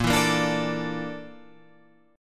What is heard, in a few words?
A sharp Major 7th Flat 5th A sharp Guitar Drop D